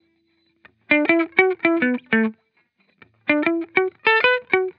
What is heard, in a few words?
Vibrations Pop Guitar Loop 100 BPM D Maj; Cymatics; Vibrations Guitar Sample Pack